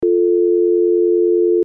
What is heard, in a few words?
dial tone